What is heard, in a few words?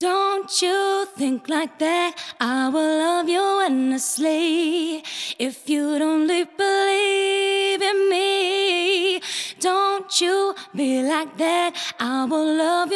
Reverbsystem 9 Female Vocals